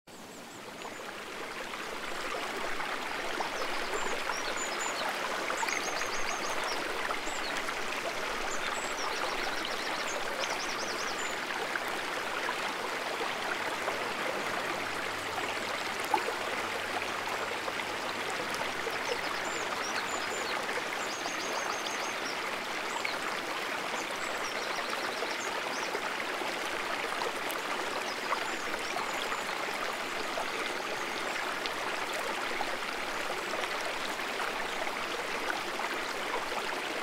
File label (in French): Son de rivière avec des oiseaux